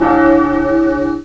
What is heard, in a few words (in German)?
anschlag glocke